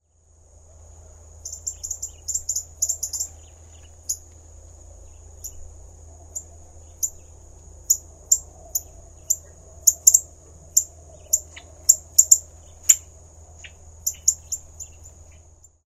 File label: northern cardinal